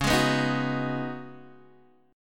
Db 7th Suspended 2nd Suspended 4th, Guitar, Open D